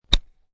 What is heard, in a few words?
Golf Ball Hit; Sports; Golf stroke, hit golf ball with 9 iron. Good, clean golf hit; Lynne Music